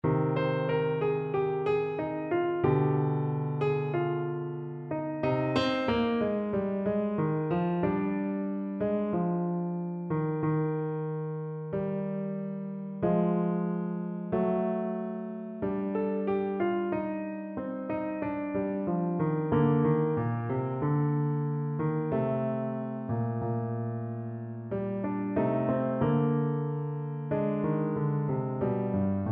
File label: Clarinet